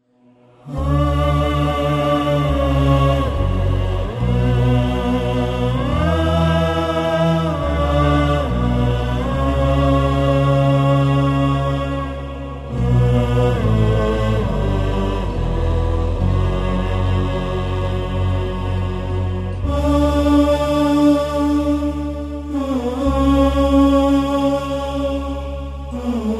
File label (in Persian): Vocal Gham